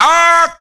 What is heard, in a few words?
"ah", Voice